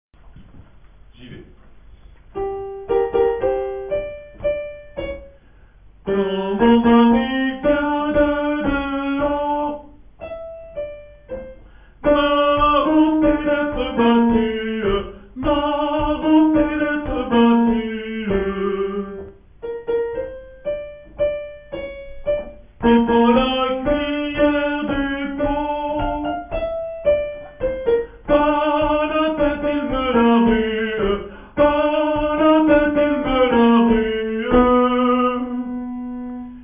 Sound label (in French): Quand mon mari Tenors